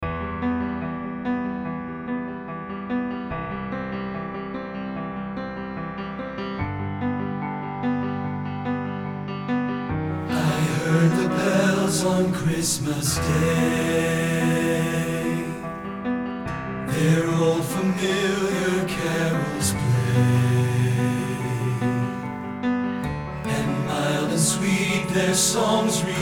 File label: Alto; I Heard the Bells